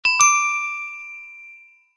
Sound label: correct; jeux